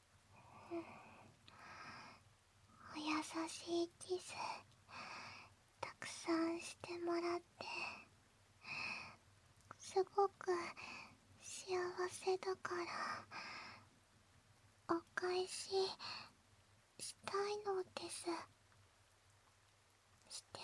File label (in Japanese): (耳舐め) 優しくゆったり耳舐めご奉仕; 優しさと幸せに包まれて -匂いフェチの臆病オドオド後輩ちゃんをたくさん甘やかす幸せいっぱいな170分